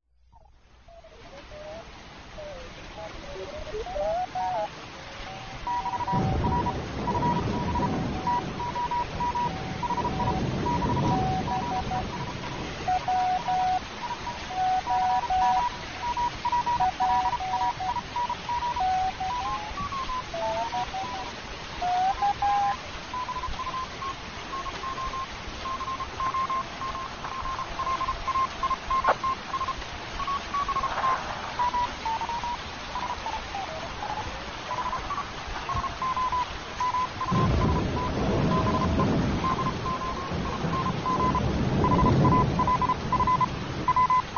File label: storm and rain and nature sounds